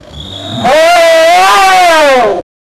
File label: jaguar roaring